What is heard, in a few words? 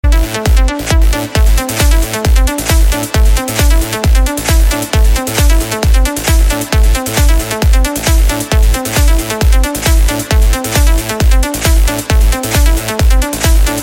club mix background music tone